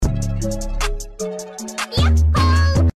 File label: ya hooo